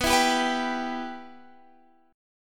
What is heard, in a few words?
B Suspended 4th Sharp 5th, Guitar, Standard; strummed; Bsus4#5; JGuitar